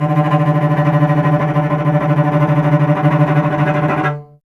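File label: strings cello D; Miroslav Vitous; Solo Instruments VOL-1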